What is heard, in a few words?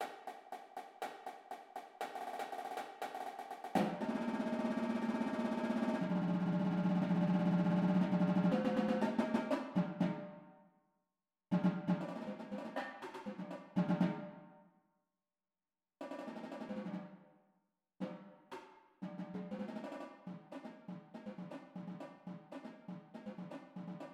cadence tenors, drumline